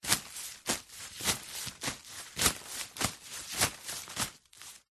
footsteps walking on fresh healthy thick leaves foliage; zapsplat